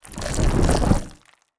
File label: worm folding, creatures